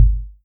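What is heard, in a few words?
bass drum gas, kick; drums; one-shots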